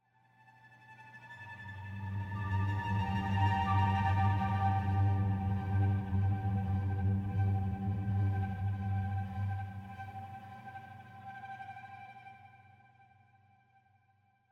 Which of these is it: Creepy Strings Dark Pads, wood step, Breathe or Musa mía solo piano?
Creepy Strings Dark Pads